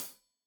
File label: Hat Tight, Hi-Hat, Cymbals; Deeply Sampled Drums